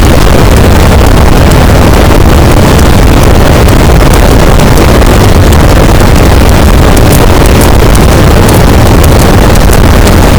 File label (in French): cachalot positif, boat; Physeter Macrocephalus